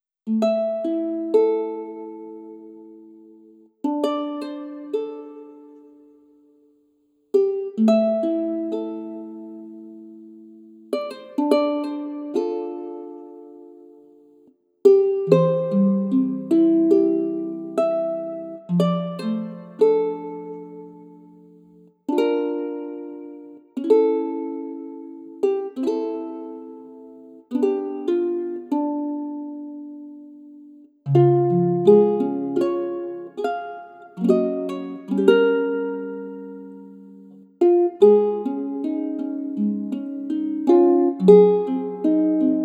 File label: Lost Soul, guitar; guitar kontakt library